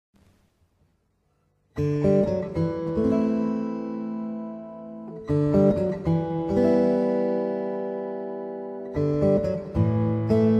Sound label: fingerstyle